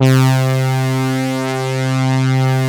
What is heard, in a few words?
SYNHORN; Greytsounds; Scott Peers Sound Engineering